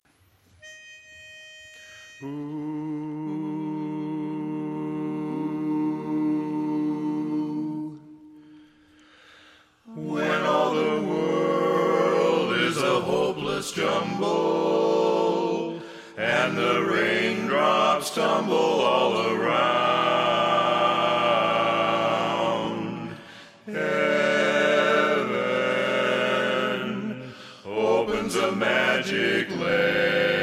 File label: BASS, Chorus